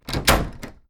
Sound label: door close; svencoop